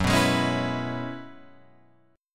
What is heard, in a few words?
Gb 9th Suspended 4th, Guitar, Open F; Gb9sus4; strummed; JGuitar